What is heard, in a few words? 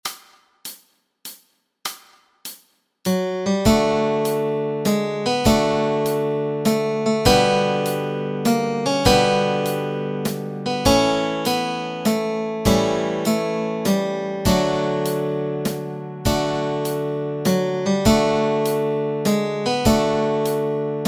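melody and chords, Easy Guitar and Ukulele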